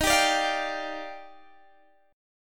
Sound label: Eb Major 9th, Guitar, Open D